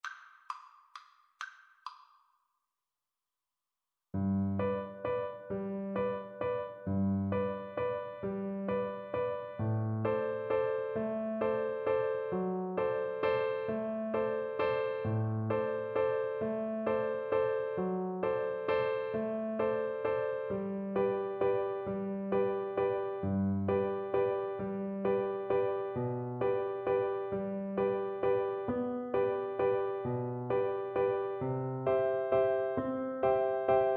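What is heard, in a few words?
vlc karaoke, Cello; blue danube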